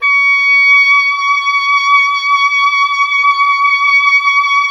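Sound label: Wind OBOE C; Miroslav Vitous - Solo Instruments VOL-2; Solo lnst. II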